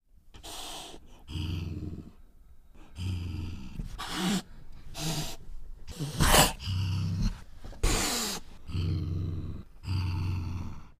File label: Cat Fight Hiss Angry Ani